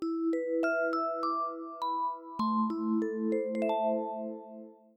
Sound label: Death; habitica; luneFox Theme